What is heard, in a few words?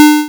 8 Bit Drop